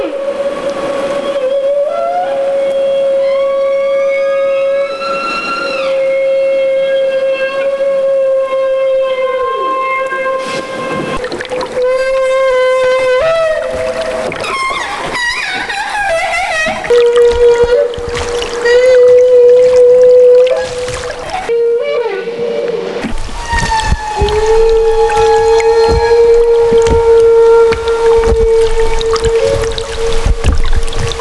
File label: Humpback Feeding Call; Jim Nahmens